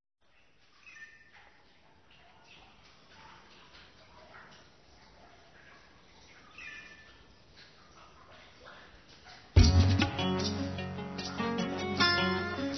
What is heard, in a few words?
Fusion Music Raga; RKC Live Stream; Travel India